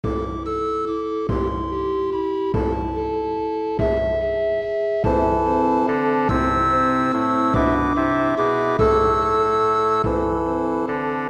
Bassoon Track with Melody; We 3 Kings

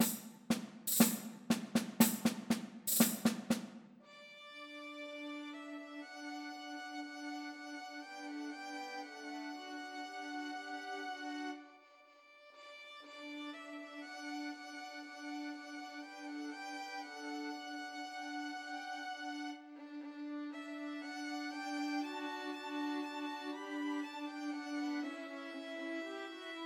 Christmas Trios for Three Violins

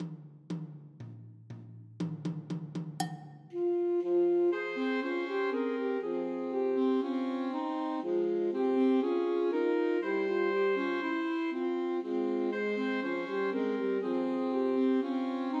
Christmas Trios for Three Alto Saxophones; God Rest Ye Merry Gentlemen

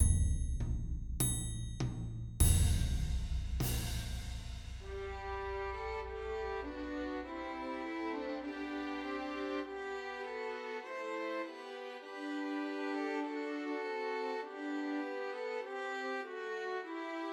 Trios for Three Violins; See Amid the Winters Snow; Christmas

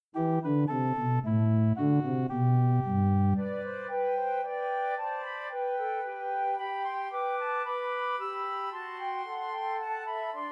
Trios for Three Flutes; Away in a Manger; Christmas

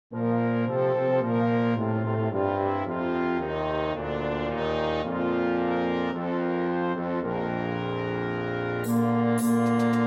Clarinet track with Melody; O Come All Ye Faithful

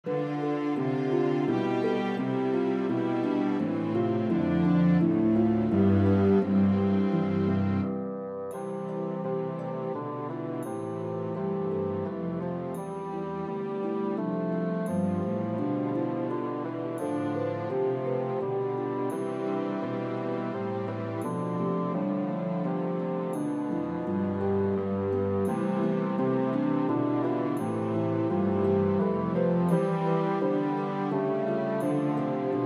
Bassoon Track with Melody; Away in a Manger

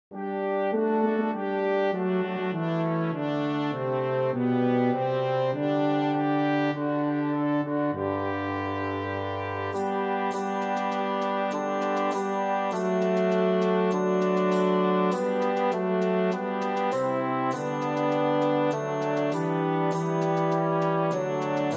Mini Bassoon track with Melody; O Come All Ye Faithful

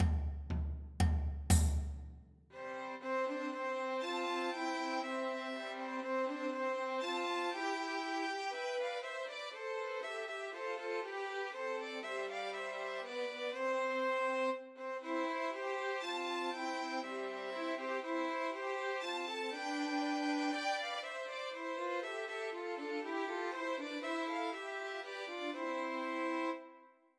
Trios for Three Violins; The Holly and the Ivy; Christmas

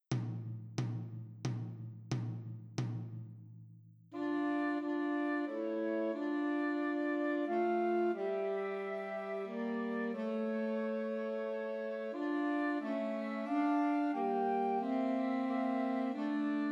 Trios for Flute Alto Sax and Tenor Sax; Christmas; Coventry Carol

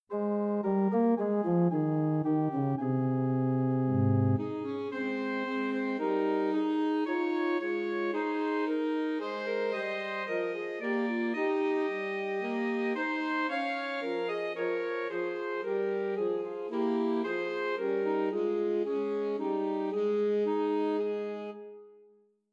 Christmas Trios for Three Alto Saxophones; While Shepherds Watched

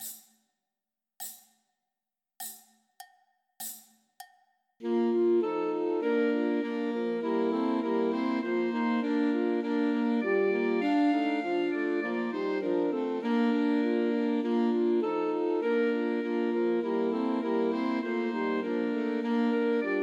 Trios for Three Alto Saxophones; Once in Royal Davids City; Christmas

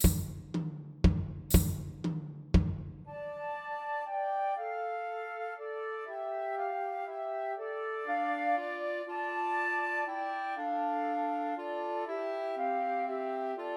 Trios for Two Flutes and Clarinet; We Three Kings; Christmas

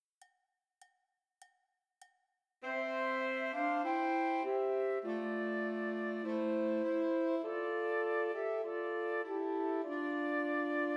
Trios for Flute Alto Sax and Tenor Sax; Christmas Trios

